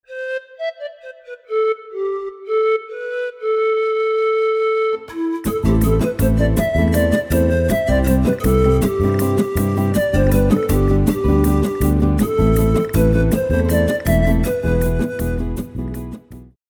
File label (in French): musique des andes